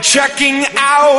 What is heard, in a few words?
checking out SUNG